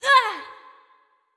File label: Prone; Jackie